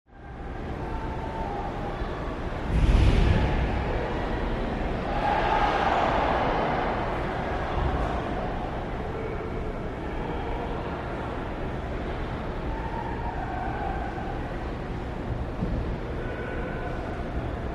Medium Sized Distant Crowd Recorded From Under The Bleachers With Heavy Fan Ambience; reactions